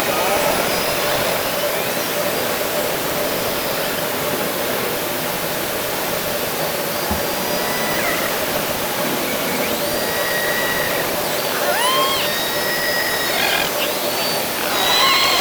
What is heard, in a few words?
Orca sound Night Passby